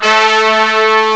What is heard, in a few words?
HORN, BRASS; M8; CMI Factory